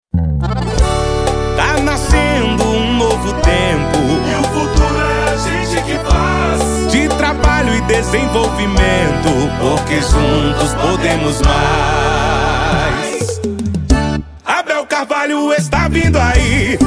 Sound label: jingle